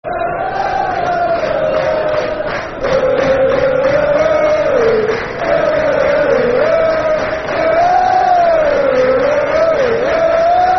Good Ol' Sussex By The Sea, Football Chant (Soccer Song); Brighton Bhafc